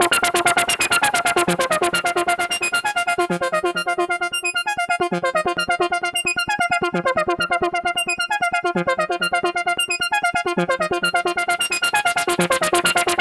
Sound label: Synth Arpeggio, Synths; Eclectic Electronica